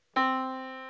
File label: Yamaha Disk, piano sound